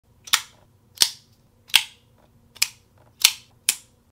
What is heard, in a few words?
flick knife; چاقو ضامن دار